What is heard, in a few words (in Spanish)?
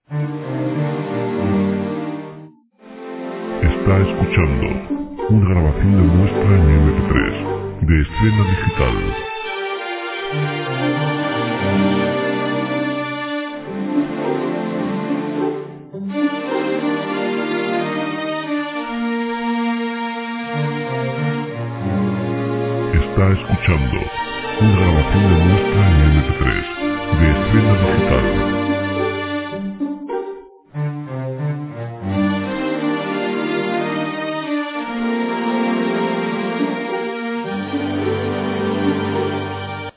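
SINTONIA, Música para televisión; documentales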